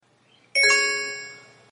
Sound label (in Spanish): notificacion